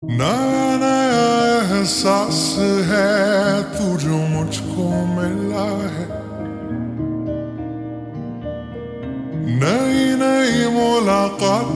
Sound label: Ringtone Slowed Reverb